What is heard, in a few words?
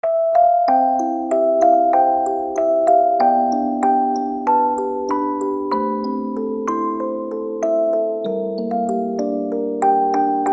Music Box; Anime Inuyasha OP